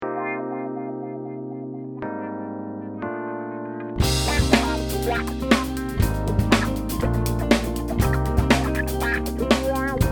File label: Funk